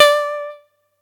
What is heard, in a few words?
atari 520st